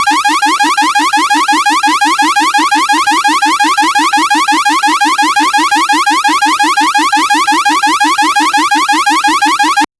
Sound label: retro 8 bit warning sound